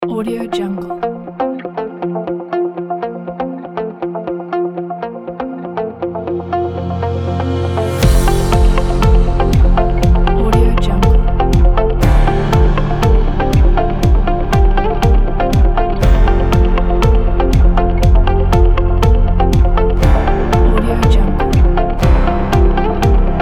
fancy upbeat

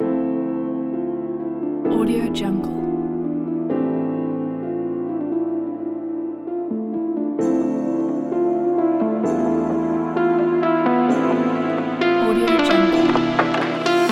inspiring cinematic electronic; janevo

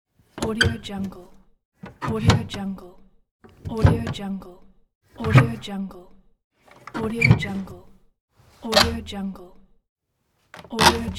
opening old wooden door

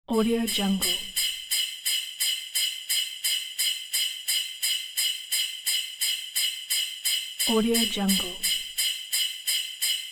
christmas bells

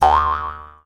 mouth harp boing; sound ideas

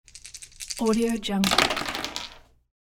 dice roll

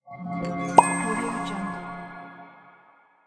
open magic chest

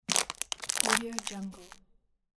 stepping on plastic bottle

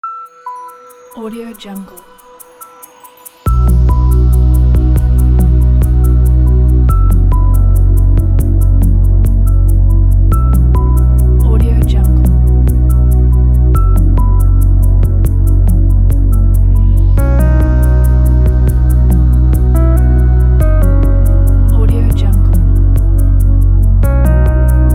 in game chill; erikbode

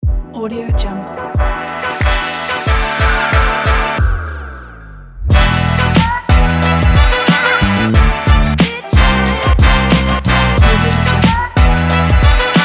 hiphop

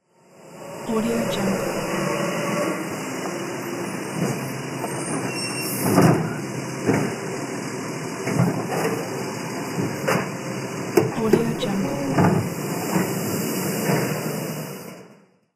garbage truck bin pickup

